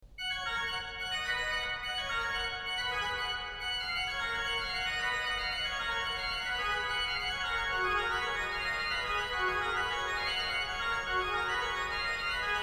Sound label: Piece d'Orgue BWV 572